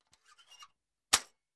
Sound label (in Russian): vistrel igrushechnogo pistoleta